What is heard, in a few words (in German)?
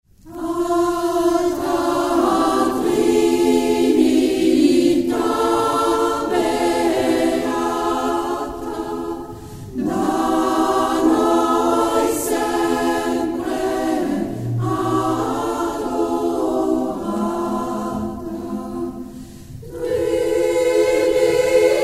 Damenchor; Arbon; Alta Trinitata beata